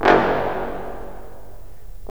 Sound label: BRS, Roland LA Composer